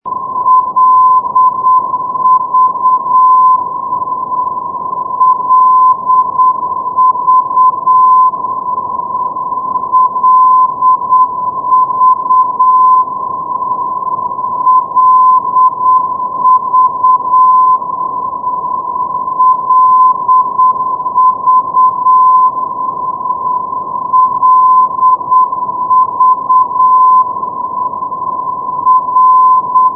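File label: ndb, non-directional beacon; koeln-bonn lv 327.0 wik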